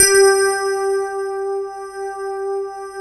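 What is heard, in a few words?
Rhodes G2, right; East West - Phat & Phunky CD2; 90's Sample CDs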